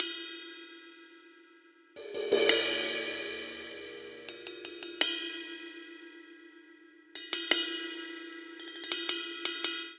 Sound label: Straight Seven Rock Cymbals Only 7/8 84 bpm; Ableton; Factory Packs; Drum Booth